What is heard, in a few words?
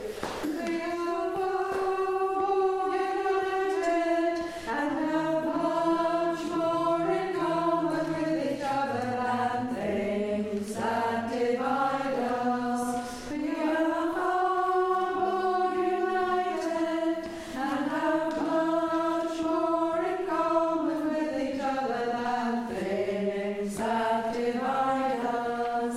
Alto